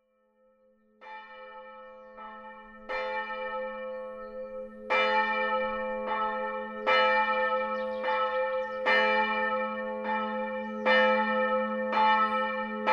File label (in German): glocke, Dobbertin